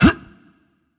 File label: grunt jump; smite; hunbatz